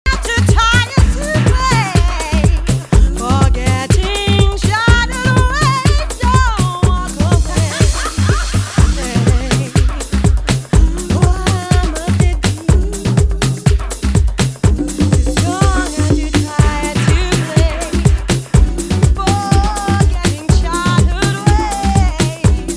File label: bang the drum vocal mix